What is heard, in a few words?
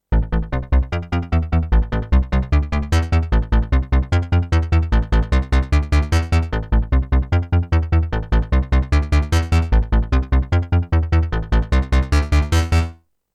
Iridium Core Slap